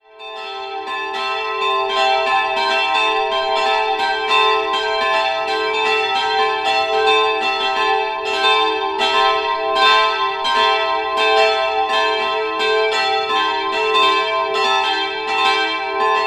glocken, Evang. Dietrich-Bonhoeffer-Kirche; Nürnberg-Langwasser

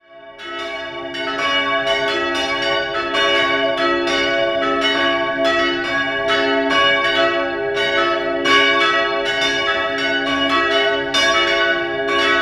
Sehensand, glocken

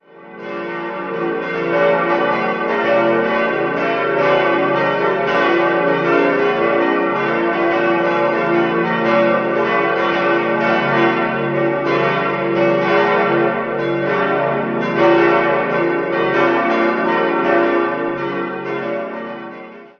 Ettenstatt, Ev. Johanniskirche, Glocken

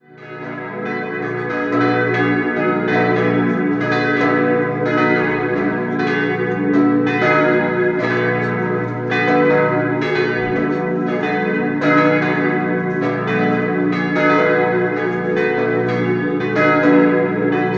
Amerang, Glocken

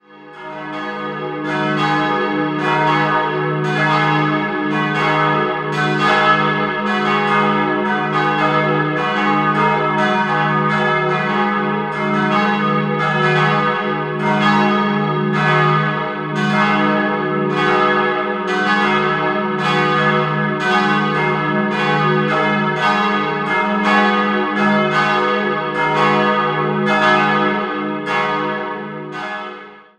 Bad Wildungen, Glocken

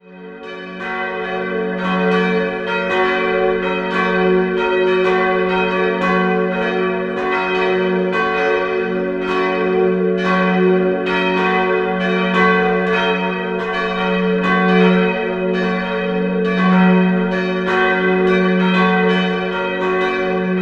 Dietersdorf, Evang. Georgskirche, Glocken; Schwabach; 1913/14; Dietersdorf ist ein Ortsteil der Stadt Schwabach

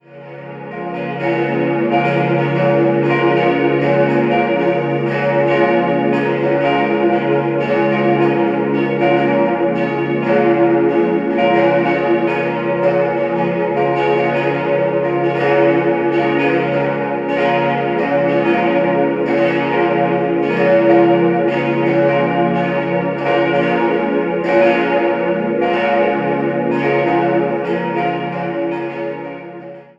Baierbach, Glocken, Pfarrkirche; St. Andreas; Salve-Regina-Geläut: des'-f'-as'-b'; im Jahr 2019; in Passau gegossen; Rudolf Perner

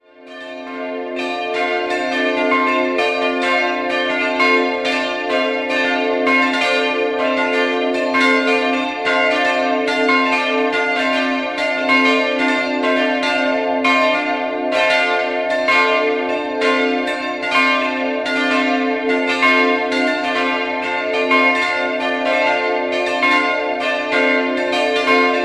Nürnberg Almoshof, glocken

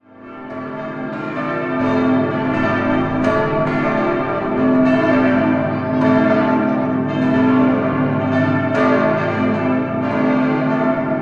St. Urban, glocken; St. Urban LU

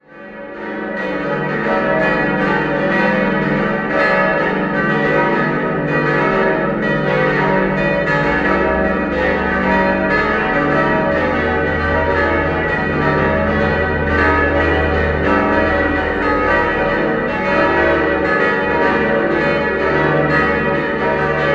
Glocken, Hofkirche Unsere Liebe Frau; Neuburg a. d. Donau; im Donautal

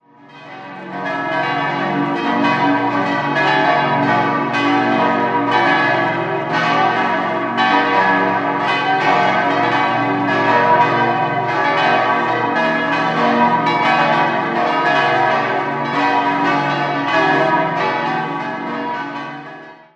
Wengen, glocken

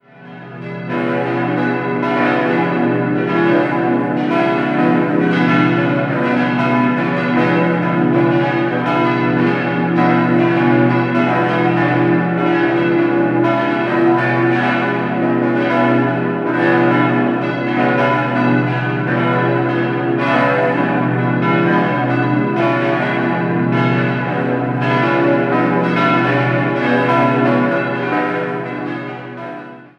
Häggenschwil, Glocken, Pfarrkirche; Häggenschwil SG; St. Notker

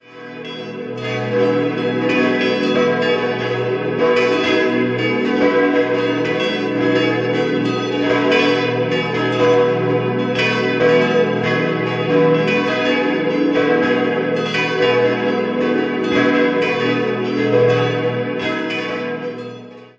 Oetz, glocken

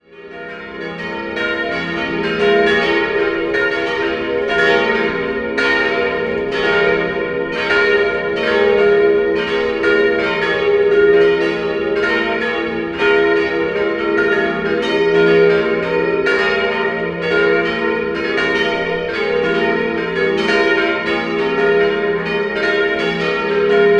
Hohenried, Glocken

